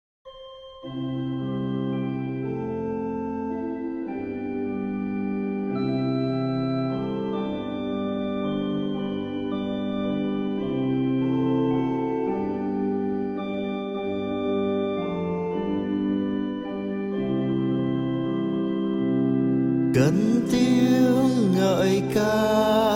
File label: Bass